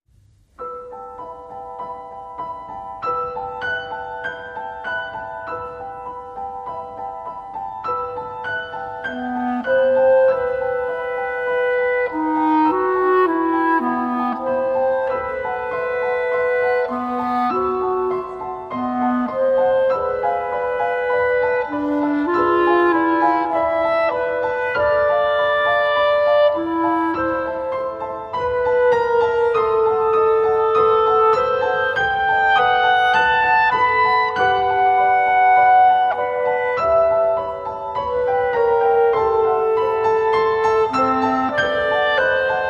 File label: Piano & Clarinet